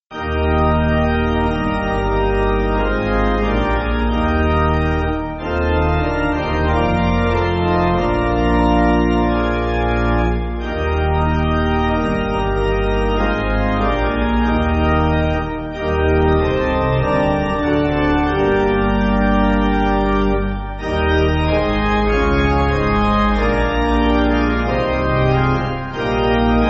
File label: Pipe, church music